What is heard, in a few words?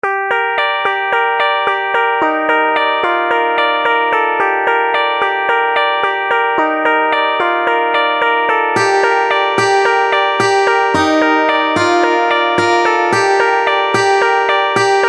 Bass Guitar